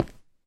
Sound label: stone, step; minecraft pe 0.6.1